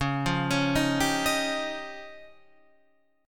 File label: F 7th Suspended 2nd Sharp 5th